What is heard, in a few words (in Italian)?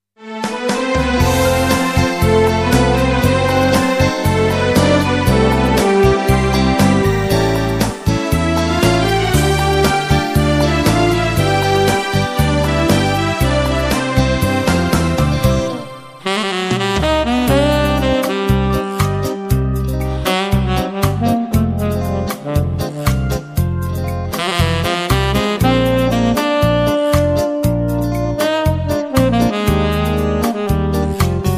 nostalgico sax